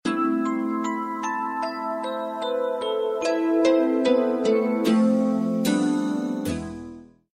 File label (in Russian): Красивое смс